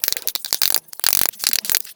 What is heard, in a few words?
running; chimpmunk